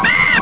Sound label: scream